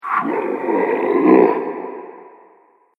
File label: Disgruntled Beast, monster; Fantasy Sound Effect; Andrew Fleming; Twistedtracks